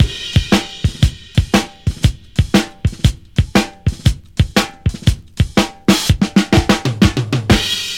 120 bpm drum loop sample d key, Free drum beat - kick tuned to the D note. Loudest frequency: 1068Hz